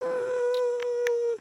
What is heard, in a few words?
curious, emotes